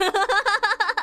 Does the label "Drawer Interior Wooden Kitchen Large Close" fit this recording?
No